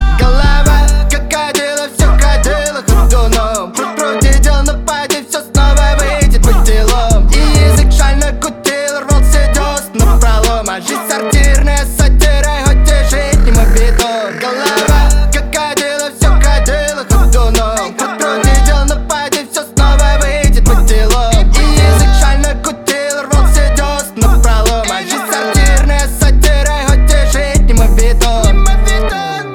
polka

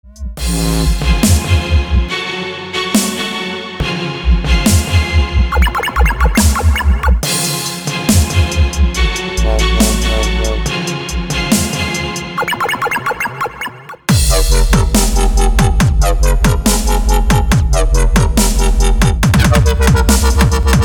minimal techno trance

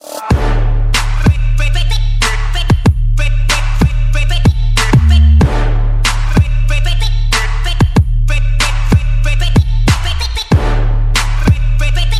trap remix

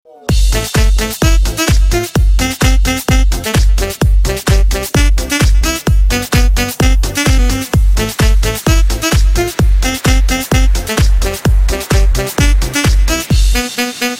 otbivka saksofon

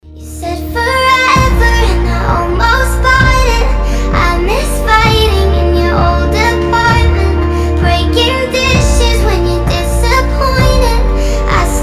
speed up reverb

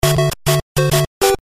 mario zvuk

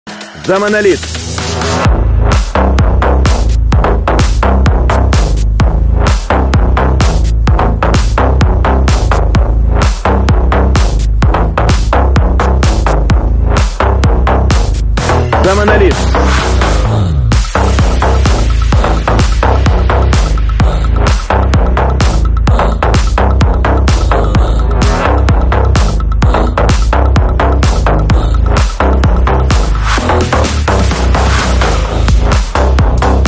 slap bass

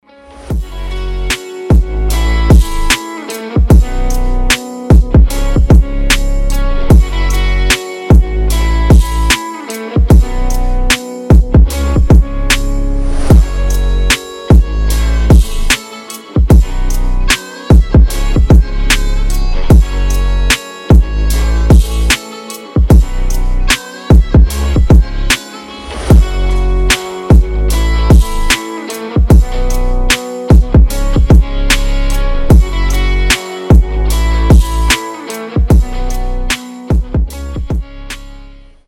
western type beat

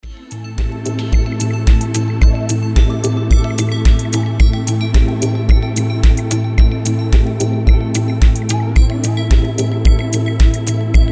impact

